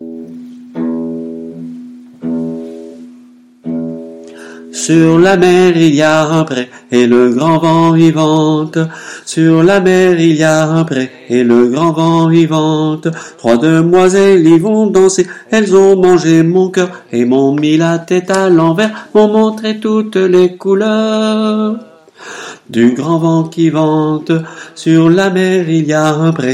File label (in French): Grand Vent Hommes, chorale